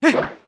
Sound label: Backflip; Link's backflip (same voice used for rolling)